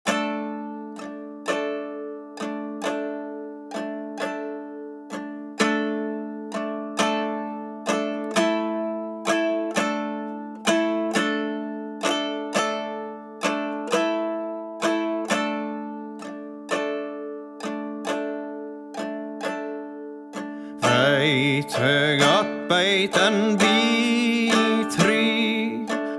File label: song nordic; assassin s creed valhalla